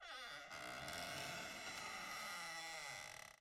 door squeaky; angband

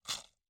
bite short; angband

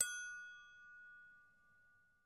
tone goblet; angband

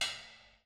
bang metal; angband

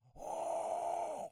creature groan; angband

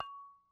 jar ding; angband

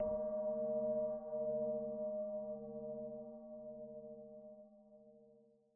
gong temple; angband

